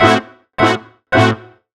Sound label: Stabbin the Brass